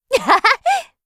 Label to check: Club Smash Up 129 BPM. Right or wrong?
Wrong